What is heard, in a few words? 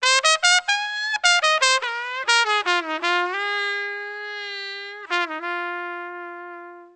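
trumpet fx; Musical Instruments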